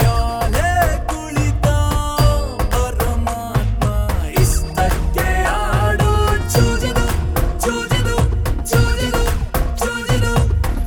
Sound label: Bangra Master